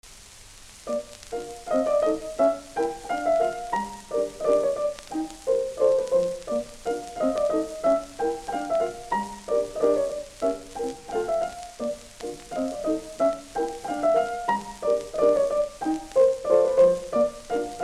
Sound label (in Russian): Полька и Итальянская песенка; А. Гольденвейзер; Чайковский; детский альбом